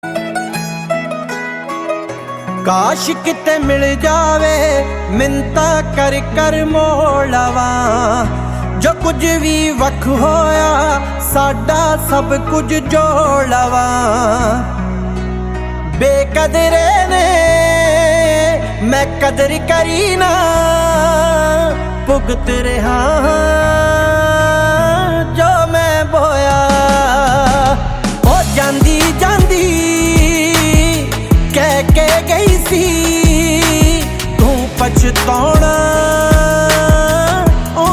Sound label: PUNJABI RINGTONE